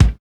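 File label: BASS DRUM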